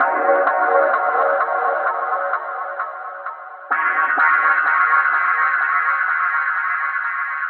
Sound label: VFX2 Loop 128 BPM